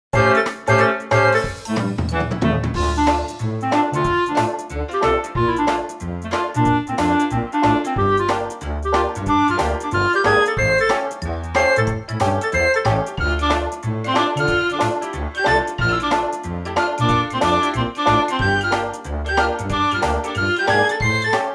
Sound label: Drum Dash Deluxe; Kirby Triple Deluxe; Dedede’s Drum Dash Deluxe